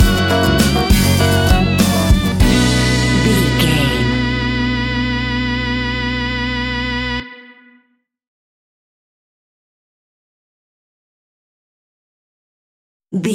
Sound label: spanish cafe stinger; A Spanish Café opens it's doors with the exotic and tropical sounds of the sunshine!